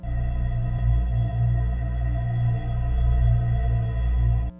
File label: bell